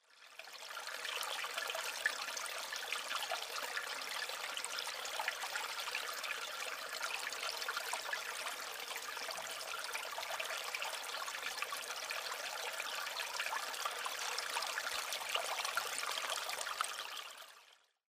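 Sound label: water sound; mizuoto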